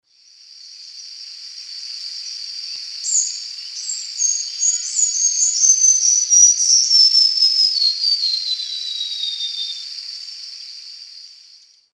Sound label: Basileuterus leucoblepharus song